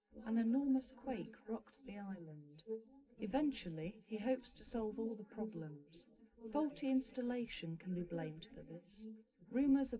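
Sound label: female, live recording 130ms 1m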